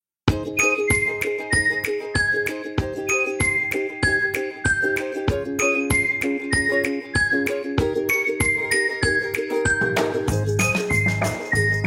musica

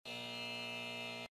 sonido error

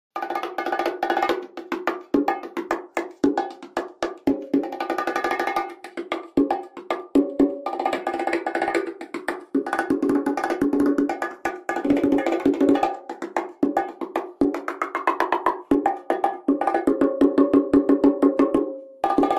SONIDO DE TIMBALES

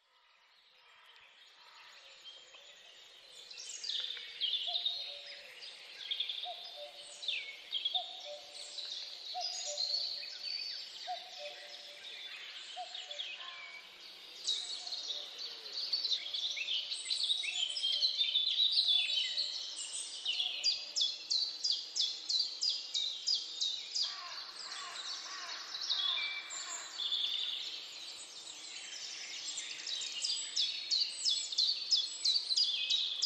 efectos de sonido, naturaleza

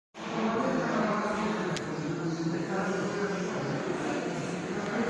murmullo

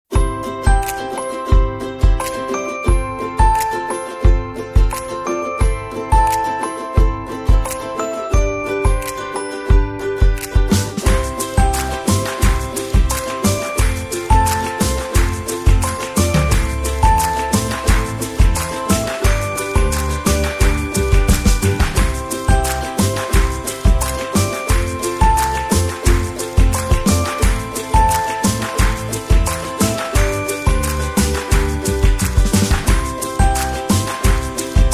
Música de Fondo

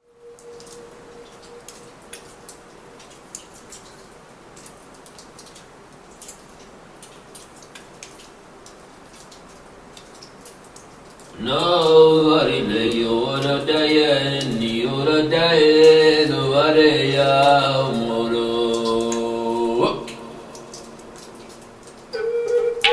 Música para danza; sapiens en cueva neandertal

